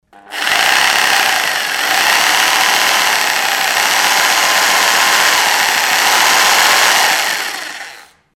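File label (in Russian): звук перфоратора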